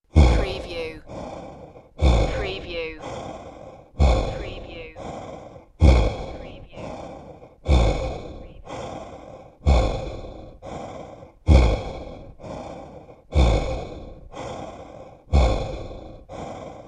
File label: Evil Breathing